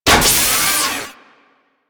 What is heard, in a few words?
Animal Foundry Pillar Raise; Tenno; Warframe; Liset; Hub